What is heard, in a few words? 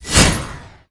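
spell illusion fail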